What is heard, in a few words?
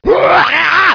zombie scream; zombierpg